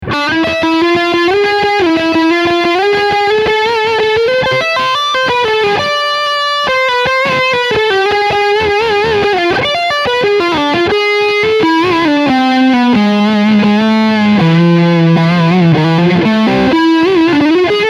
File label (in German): Leadsound Steg HB